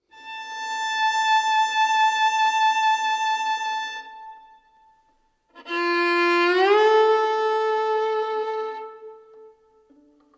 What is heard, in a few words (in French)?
Chant de Berger, Musique de Suède